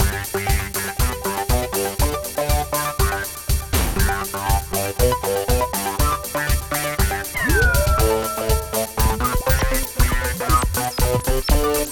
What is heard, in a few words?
trance loop; akaushirobo